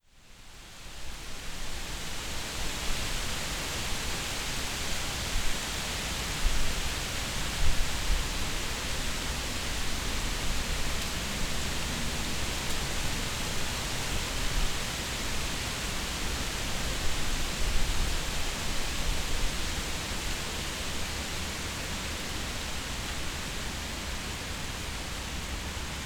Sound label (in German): Silberpappel; Novi Sad